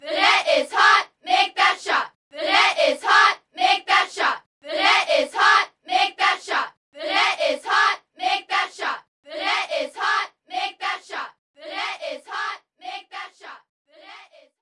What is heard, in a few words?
Sfx Basketball